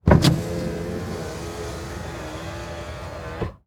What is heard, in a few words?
Transportation Cars Honda Civic Roll Down Window Exterior